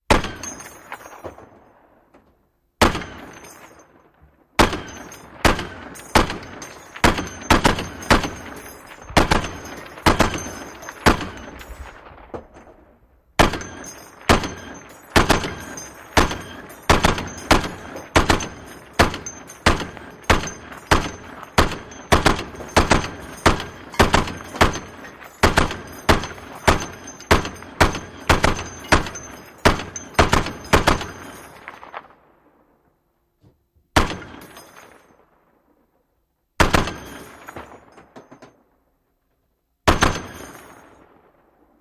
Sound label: 50 Cal Various Speed F